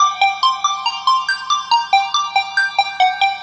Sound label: NRG Pad